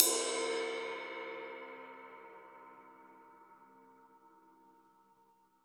Drums Acoustic, RAW KIT; USB Soundscan; AKAI

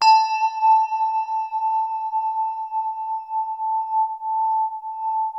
GLAS A3, ATMOSPHERICS; Music Store - Claudius Diemeter Schwerlos

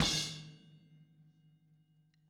Boogaloo - Outro - Snare, 82 BPM; Sonic Foundry; Drums from the Big Room The Mixes

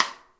SNARE; Sonic Reality; Interactive Drum Kits

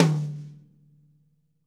MID TOM RSHOT; KITS-LARGE

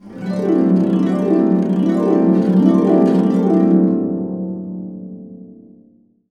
C CASC UP DN, Harps

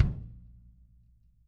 KICK L

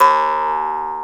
Jaw Harp Oo; Multisamples; Digital Sound Factory Planet Earth

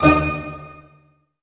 stac A, orchestra; ORCH STAC.-M

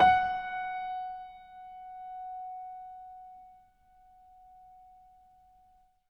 MIX MONO, Acoustic Piano

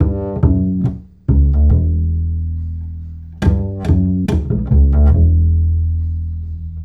Acoustic Bass

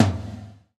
GATE TOM, Drums Acoustic; USB Soundscan vol.10; AKAI; 90s sample CD; GATEKIT 1

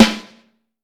HHT, Vintage Snare; Vintage Snare Drum; Q Up Arts - Johnny.C's Vintage Snare Drum

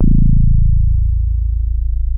DEEP FM A1, DIGITAL BASS; Music Store - Claudius Diemeter Schwerlos

